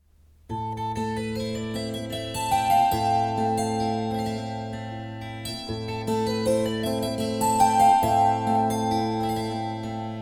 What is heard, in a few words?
Waltz, Kantele